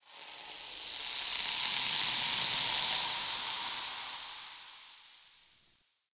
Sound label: Zariman Steam Blast; Warframe; The New War; Ambience